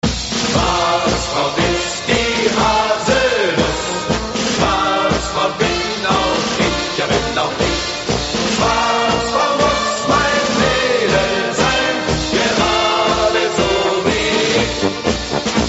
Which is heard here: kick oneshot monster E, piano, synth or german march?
german march